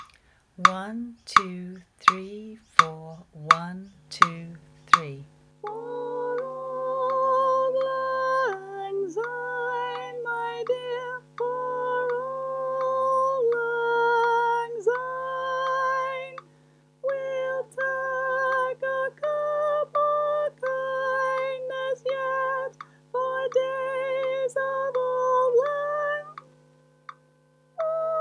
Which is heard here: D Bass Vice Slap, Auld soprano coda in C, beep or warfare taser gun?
Auld soprano coda in C